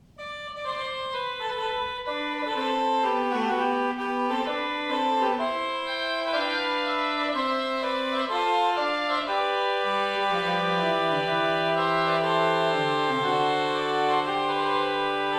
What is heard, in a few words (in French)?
Petitte fugue sur le Chromhorne, orgelbau; Francois Couperin; Gloria; eckenhagen